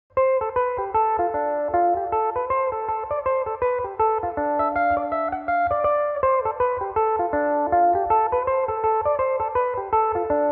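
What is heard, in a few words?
D dorian reel, Irish mandolin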